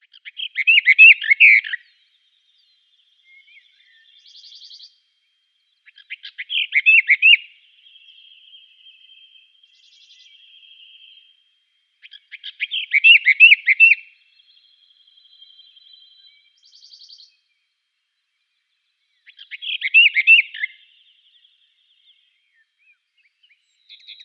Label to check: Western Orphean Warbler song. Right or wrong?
Right